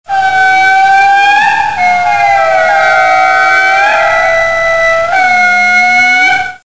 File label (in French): Cri Indri; Madagascar; Mada 2010